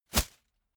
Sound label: whoosh paper scrap flutter hard